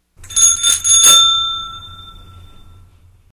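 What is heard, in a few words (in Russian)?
zvonok na velosipede, bicycle bell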